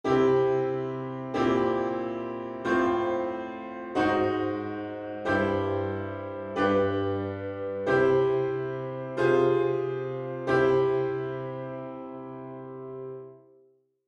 keyboard requirement

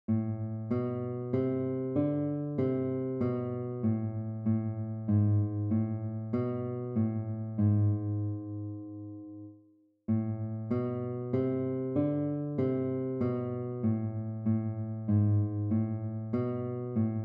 minor scale melodies

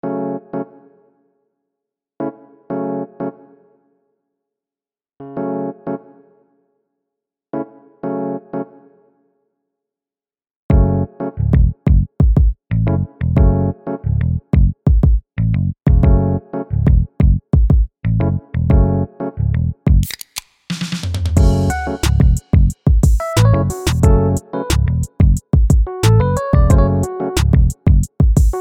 blues

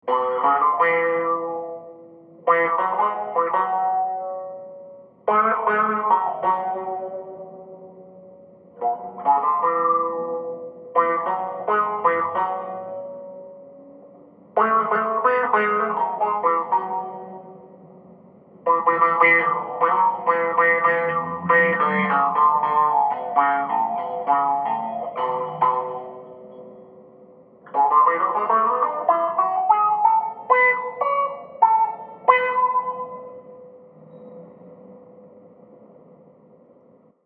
instrumental melody

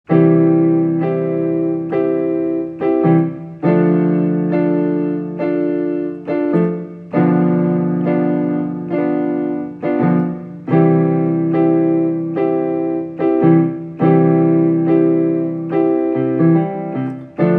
blues

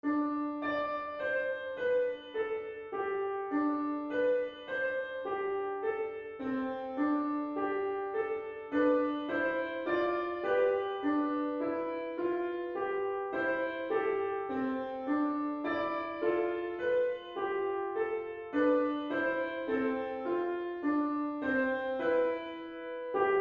in dorian mode

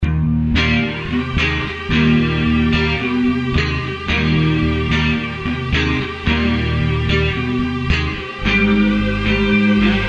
blues

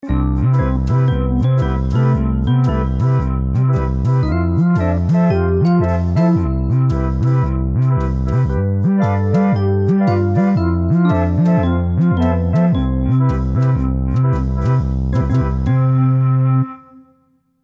blues

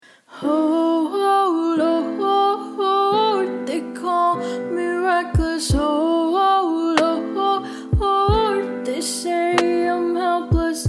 blues

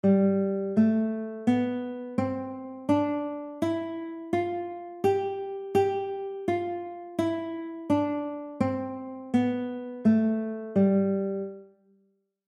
G scale exercise

